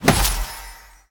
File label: grapple connect; ice age scrats nutty adventure